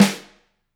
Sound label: K2 room Snr, drum